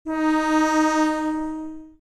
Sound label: klaxon